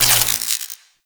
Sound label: intro mag spark; Warframe; Cinematics; Archwing